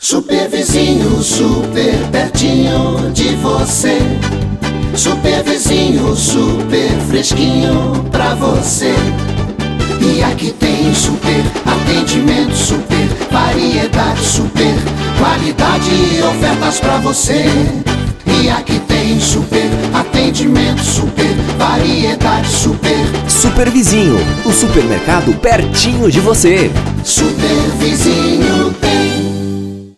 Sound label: JINGLE; SUPER VIZINHO